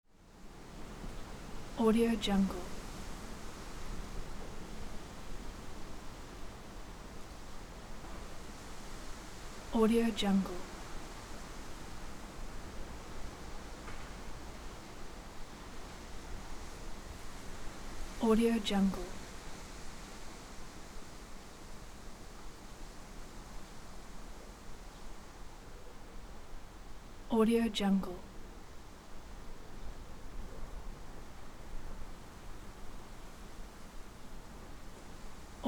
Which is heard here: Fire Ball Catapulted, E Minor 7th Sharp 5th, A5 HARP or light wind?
light wind